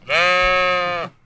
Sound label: sheep; creatures